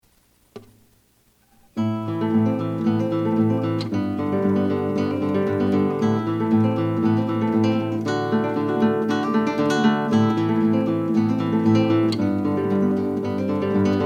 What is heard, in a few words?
Country blues; Scuola di Alto Perfezionamento Musicale di Saluzzo